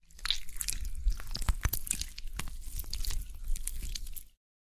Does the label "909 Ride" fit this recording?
No